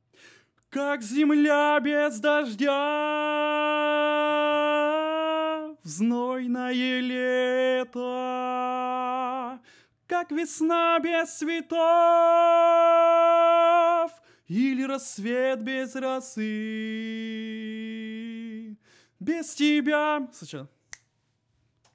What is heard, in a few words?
tenor